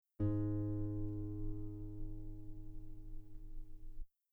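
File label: guitar G sharp 4 very long piano normal; bananenkeyboard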